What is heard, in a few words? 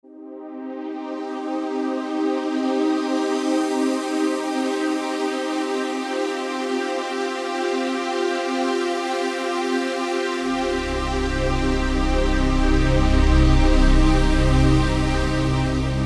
Trance, Yamaha DJX Voices